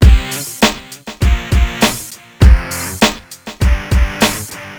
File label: tune